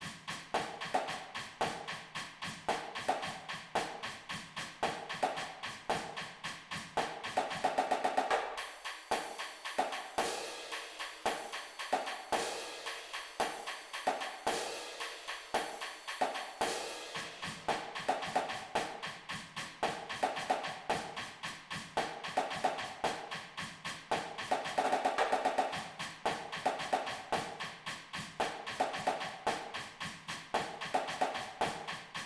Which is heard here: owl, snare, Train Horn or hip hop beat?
snare